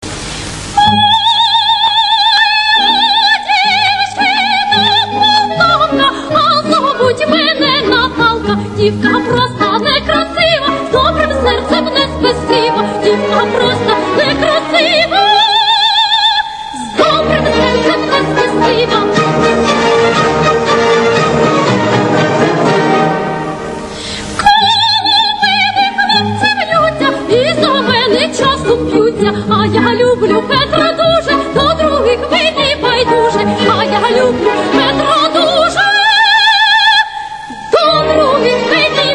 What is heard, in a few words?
aria ukrainian opera; natalka poltavka